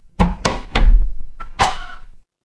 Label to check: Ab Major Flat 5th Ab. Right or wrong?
Wrong